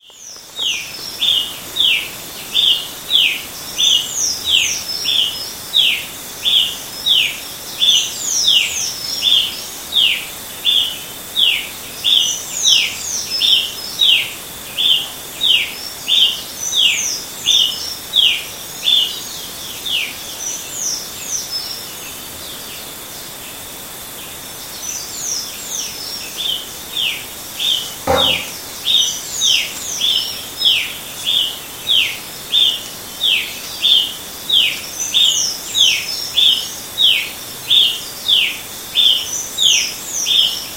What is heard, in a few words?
Ramphocelus bresilius; PN Itatiaia; Silveira